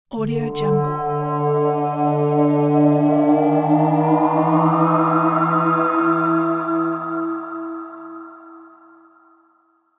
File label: haunted breath tension drone